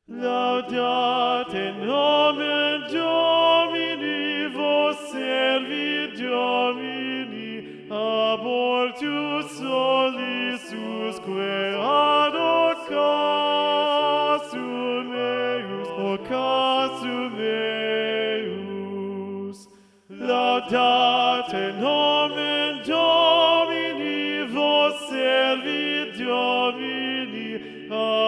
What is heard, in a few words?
tenor predominant; laudate; schola